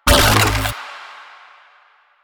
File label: Updated Shot, Weapons; Warframe; Moa Pet; Tazicor